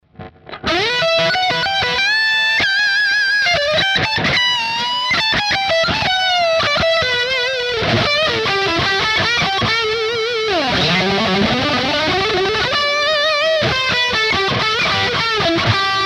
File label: Fender AM Orig. 60 Bridge Lead